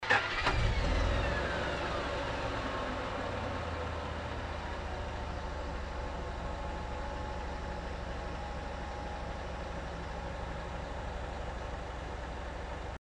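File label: Truck starting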